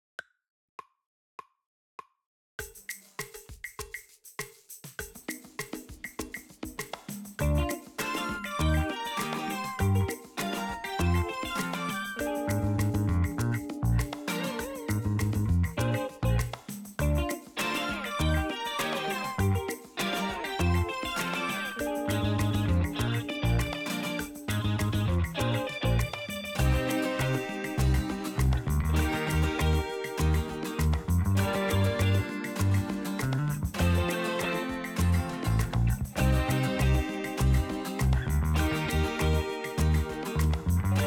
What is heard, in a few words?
drumless